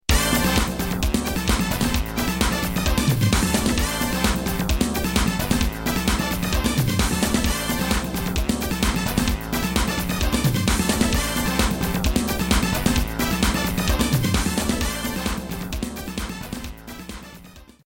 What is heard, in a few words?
music victory; spider-man